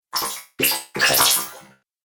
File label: Droid Wet, robot